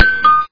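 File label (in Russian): высокий звук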